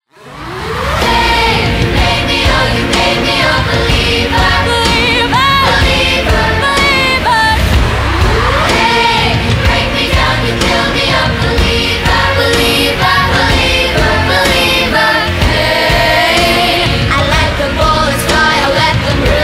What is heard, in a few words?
childrens choir